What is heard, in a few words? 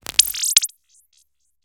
biohazard creature organic texture analog; bluezone; sonniss gdc